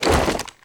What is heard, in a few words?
Swish Weapon Swing Swipe; SFX Kit